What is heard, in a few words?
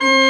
CHRCH, CHURCH ORGAN; Roland - LA Composer vol. 1